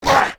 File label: Toad Dmg; Toadrin; Fly For Insanity